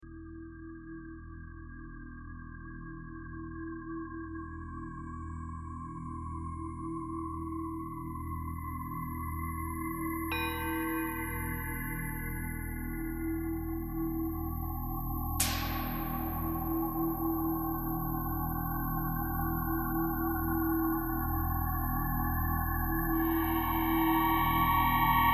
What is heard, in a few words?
suspense sequence